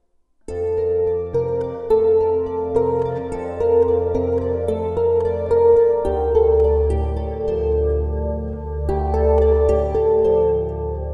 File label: Wedding Music